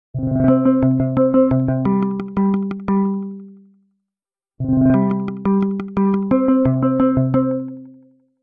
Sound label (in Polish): samsung galaxy s22 ultra, dzwonki